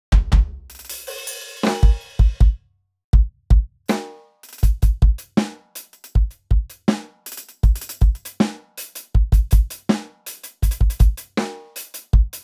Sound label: beat maker; efnote pro